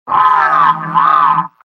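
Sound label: pyro pain critical death; vo; tf2; mvm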